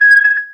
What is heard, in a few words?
Megaphone Feedback; Home & Office; 1001 Sound Effects